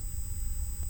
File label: Hydrophone